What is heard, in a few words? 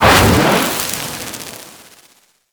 Dragon Luck Activate Fire